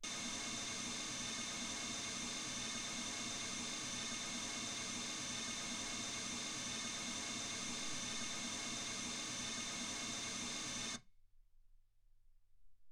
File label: mls16 48kHz